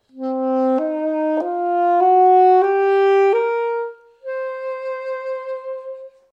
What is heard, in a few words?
Blues Scale